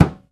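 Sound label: muted hand Timbales; E-MU Producer Series Vol. 2 – More Studio Essentials; Composer; Timbales Xswitch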